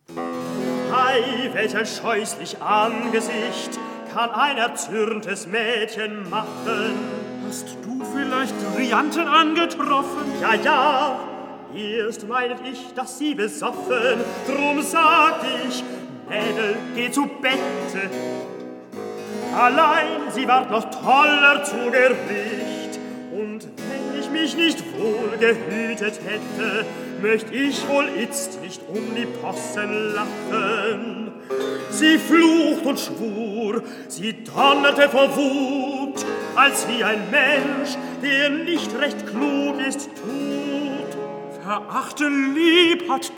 Recitativo; Ei, Welch Ein Scheusslich Angesicht

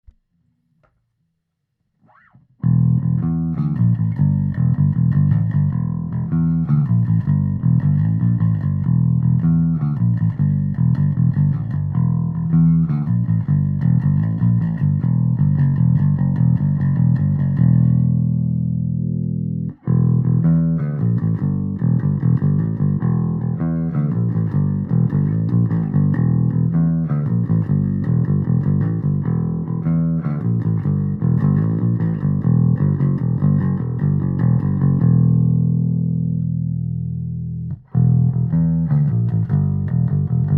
EDWARDS E-TN-95B

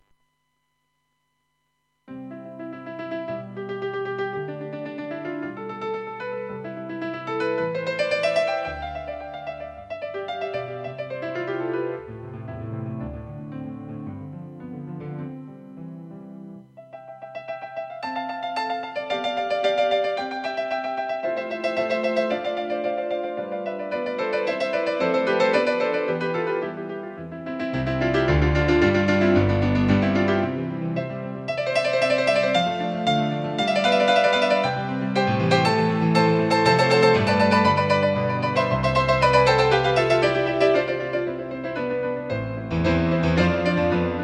PIANO

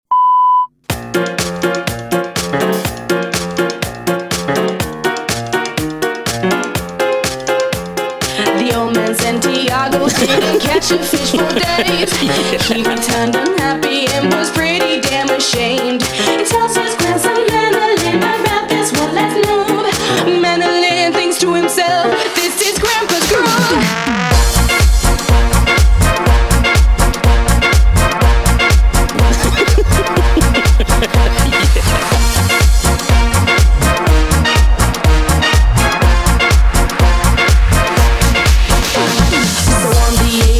Grandpas Groove

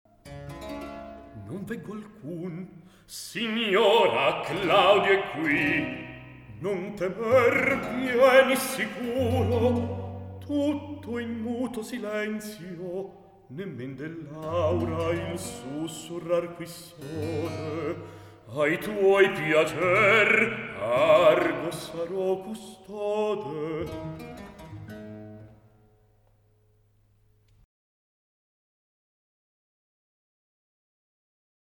Recitativo; Non Veggo Alcun, Signora

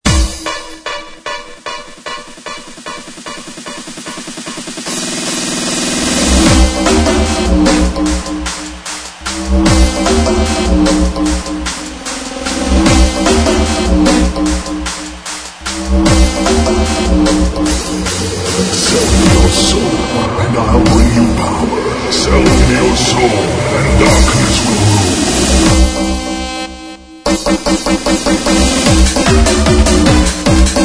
Hard Bass

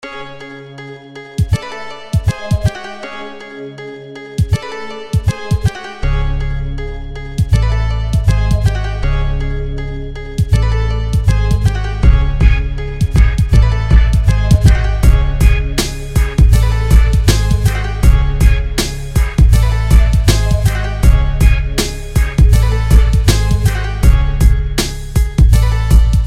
beat

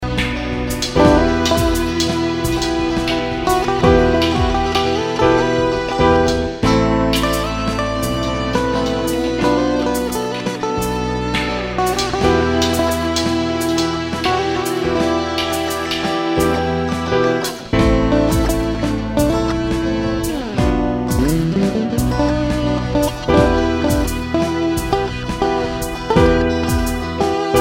guitar passion

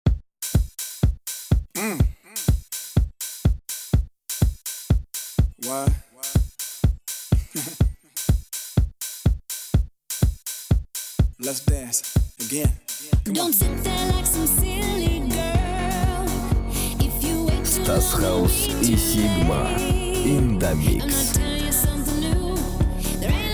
acapella

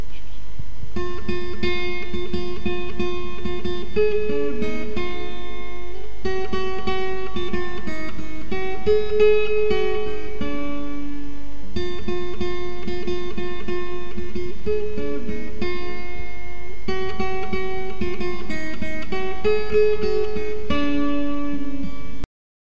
Jingle Bell

